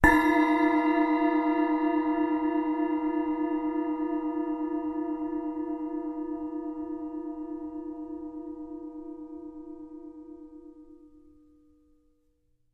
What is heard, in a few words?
Splash 8 Cymbol Strike